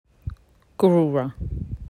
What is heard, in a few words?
Brushtail Possum; Guruwara